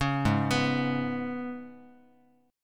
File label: Ab Minor Double Flat 5th down Guitar; Abmbb5; arpeggiated